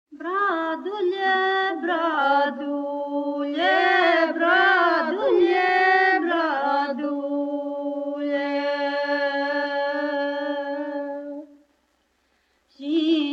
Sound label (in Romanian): Cantecul bradului